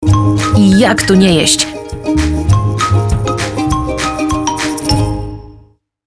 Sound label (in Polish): jingiel